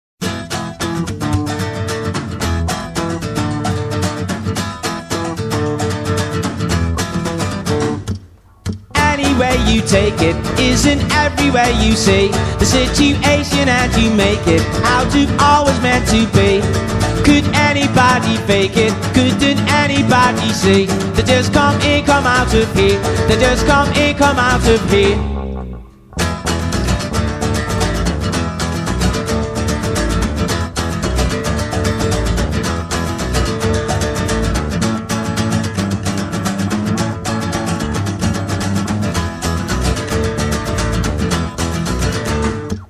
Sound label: come in come out acoustic